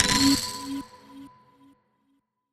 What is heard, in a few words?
Exponential Chips; balatro mods; Talisman